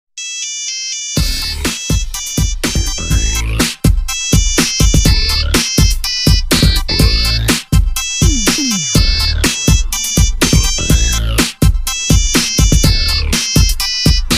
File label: Nokia Ringtone